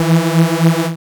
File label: BLAST E2; dubstep; Jack Sparrow; Deep Dubstep Progressions